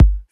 low rap steel kick drum sample g key

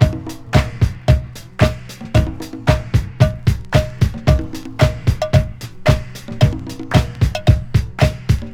112 bpm modern dance drum loop sample B key

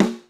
rich low end steel snare drum sound a sharp key

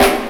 sharp acoustic snare sound A key

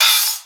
reggae open high hat a key; reggae hi-hats

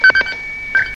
bleeping tone change

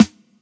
smooth snare a key

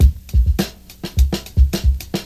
70 bpm drum loop c sharp key

